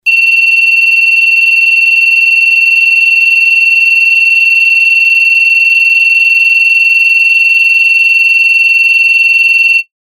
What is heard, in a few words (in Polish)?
ton